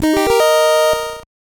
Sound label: Victory!